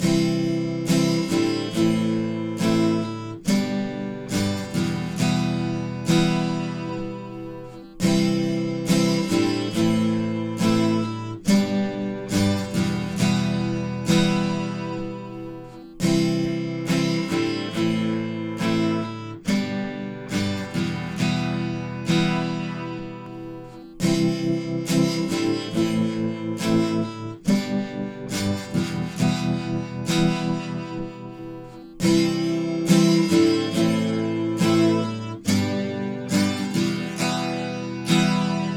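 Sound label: fender acoustic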